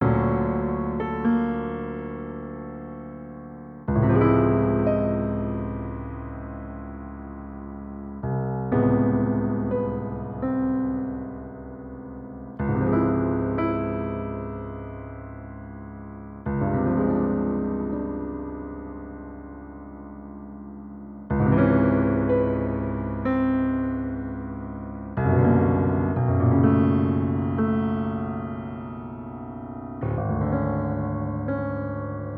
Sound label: Prelude for String Orchestra Piano